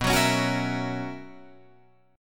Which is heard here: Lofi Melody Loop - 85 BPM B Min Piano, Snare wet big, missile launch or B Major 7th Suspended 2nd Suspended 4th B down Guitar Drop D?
B Major 7th Suspended 2nd Suspended 4th B down Guitar Drop D